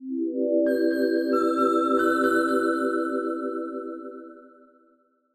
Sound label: saved; Undertale